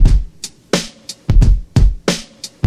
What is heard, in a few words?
89 bpm old school hip hop breakbeat sample f sharp key